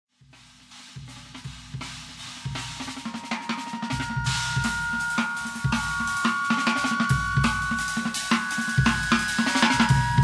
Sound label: cec loop